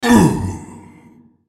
dying; Nasus; Character Voices - English

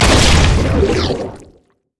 elastic slingshot; LoL; zac base

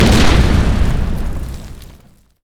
gangplank cannon; LoL; special forces gangplank

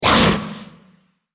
Dragon effort; Shyvana

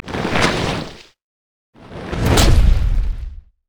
dragon emote death; LoL; shyvana

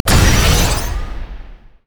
dash; LoL; vi

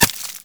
volley; LoL; ashe

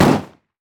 red minion mech basic attack; minions; LoL

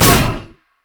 orbs missile; Diana

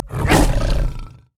swipe efforts; nidalee base

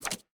pick a card flip; LoL; twistedfate

